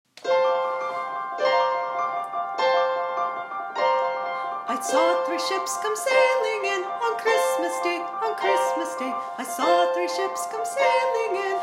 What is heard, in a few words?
i saw three ships, childrens choir